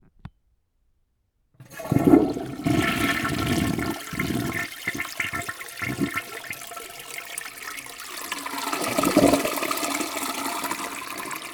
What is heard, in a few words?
toilet flush